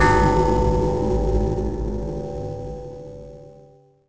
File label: bell; The Elder Scrolls III Morrowind GOTY; XBOX